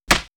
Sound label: Hit, Hong Kong Action Kit; NoizBoy; Hit Kit 1